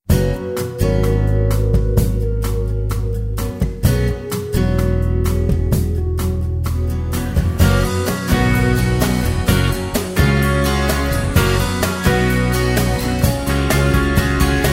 专题片背景音乐

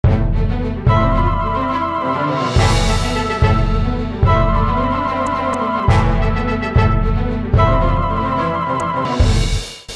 大气的背景音乐; 30秒以内

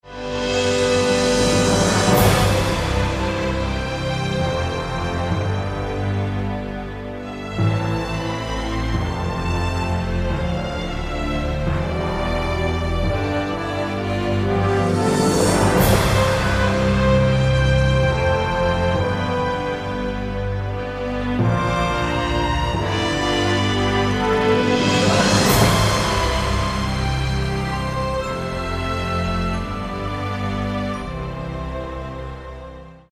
大气的背景音乐; 1分以内

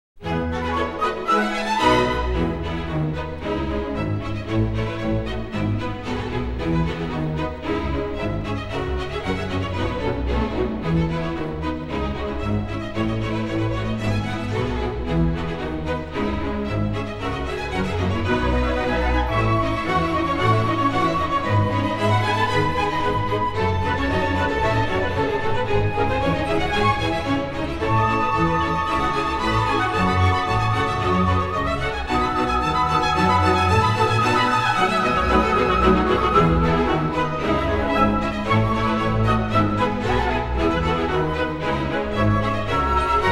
广告片音乐

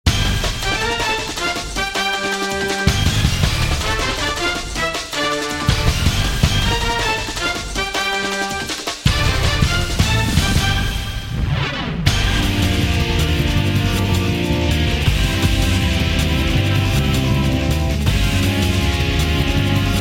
片头音乐

